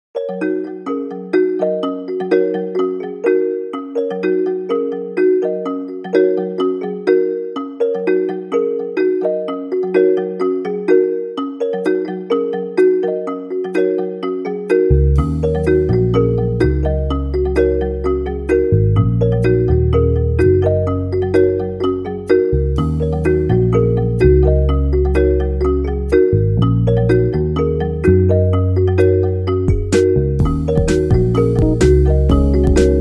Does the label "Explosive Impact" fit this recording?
No